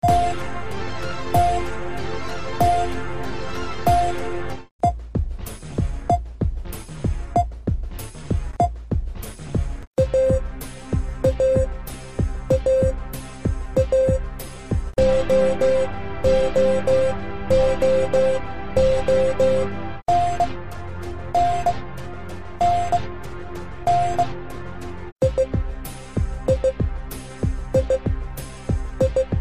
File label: Morse Song Sequence 1-T-E-A-O-N-I-R-S